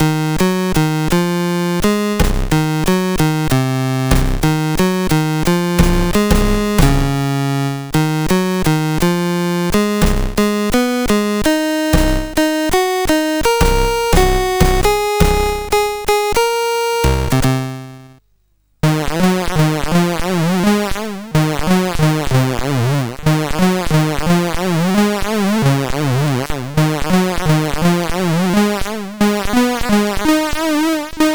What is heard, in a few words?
CSG8580R5; sid